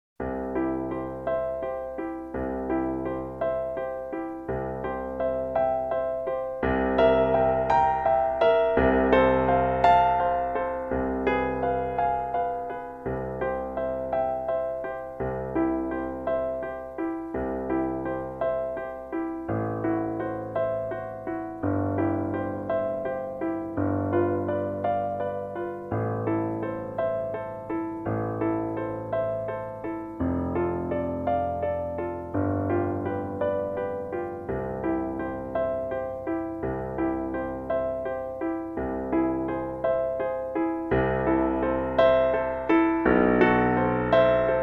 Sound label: ave maria violin; schubert